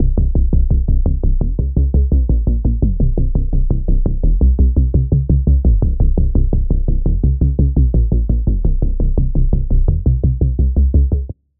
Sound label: Pulse, Serum 2; Pulse - The Pump; Routed Sound; Serum 2 Expansion - Quantum Enforcer Vol.1